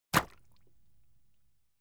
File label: Custard Pie; BBC Sound Effects Library